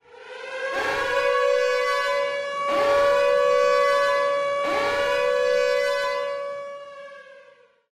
siren tone; ryan hall; tor

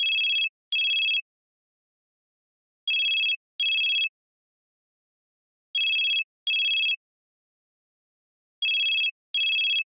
phone; miss scarlet

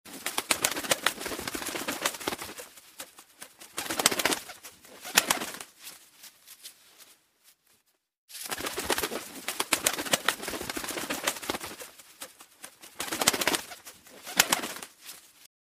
bird flutter

